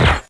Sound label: tmp, weapons; svencoop